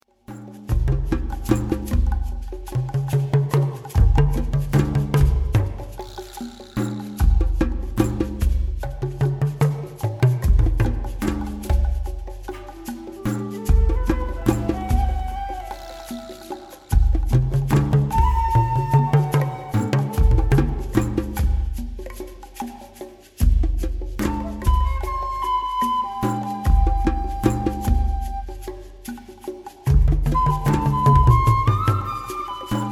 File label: dance of the drums